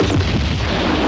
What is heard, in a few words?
bang